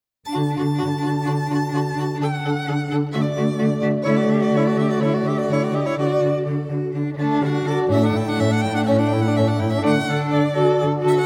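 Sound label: Allegro in D Major, Classical Quartet; Mozart; from Divertimento No. 1 in D Maj K. 136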